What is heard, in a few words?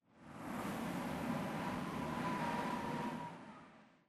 eject pilot apex wind; Titanfall 2